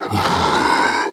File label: zombie